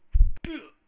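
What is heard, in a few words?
heavy dark smoke; The War Engine; Fantasy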